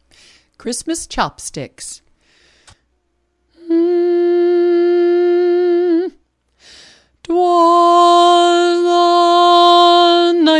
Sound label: Chopsticks bass